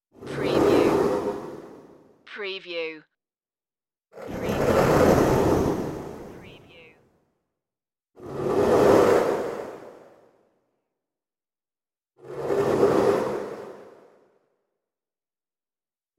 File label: outer space whooshes